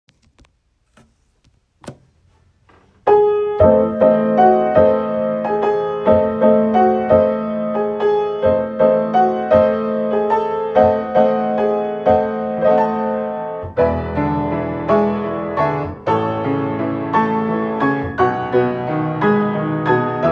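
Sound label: Ballade, guitar flute bass trio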